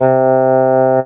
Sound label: Casio CTK-611 Bassoon C3